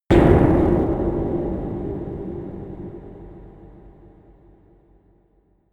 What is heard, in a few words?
slightly distorted metal plate, metall; hits explosionen